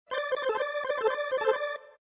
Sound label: lounge organ; Lounge & Reggae; Loopology; Be Bop Baby